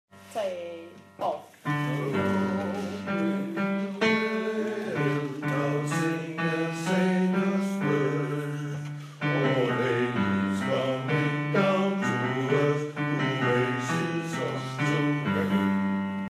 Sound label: refrain bas